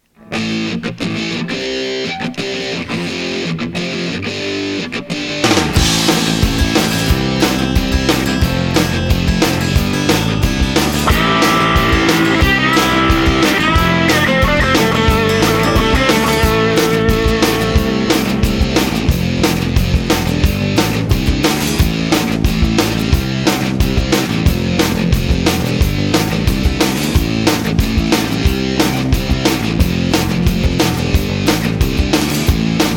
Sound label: Space Inst